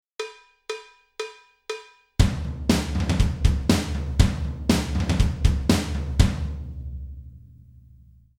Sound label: tom beat, drum